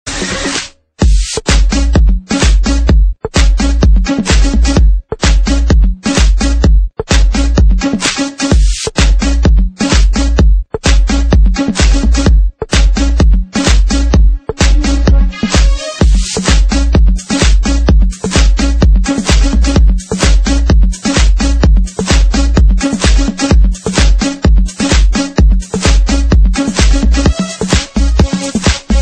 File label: House electro